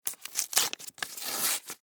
Office Envelope Tear Open Paper

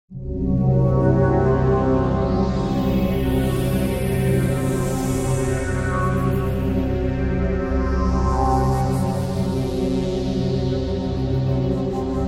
Synth, Stab, Drone, Horror, 5.1

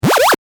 Retro SFX, Retro Style, Harsh, Heavy; Tech (Sound Effects:Science Fiction)

